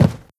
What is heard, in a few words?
step run, footsteps cardboard